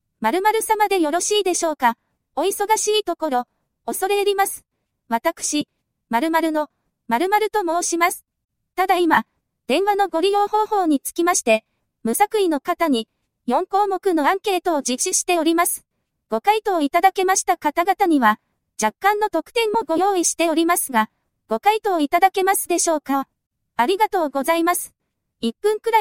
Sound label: female